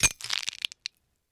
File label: Camera Flash Bulb Crackle